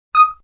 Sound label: Bleep; Beeps